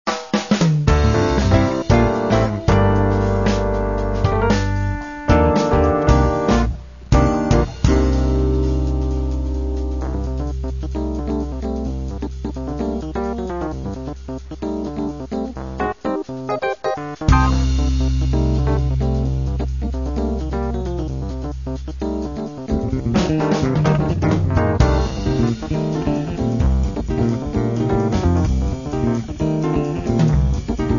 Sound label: fusion orchestra